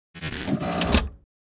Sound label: Hologram portal opening closing